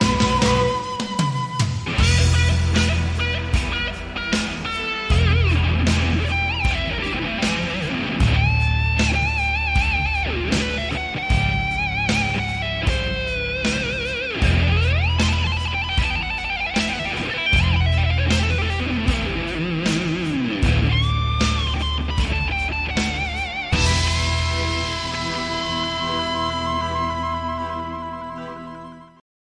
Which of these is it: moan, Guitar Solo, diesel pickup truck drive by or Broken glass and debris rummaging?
Guitar Solo